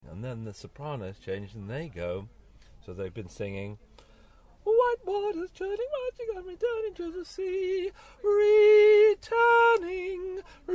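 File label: Soprano, choir; Individual parts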